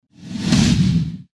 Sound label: woosh